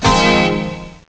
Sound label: tong